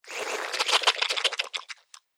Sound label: Slimy Tentacle Moves; Monster Sound Effects; Andrew Fleming